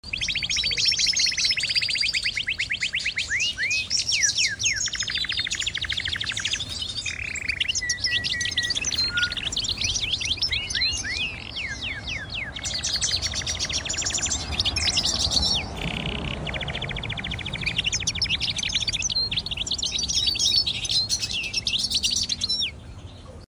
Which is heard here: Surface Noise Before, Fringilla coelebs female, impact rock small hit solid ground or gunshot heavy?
Fringilla coelebs female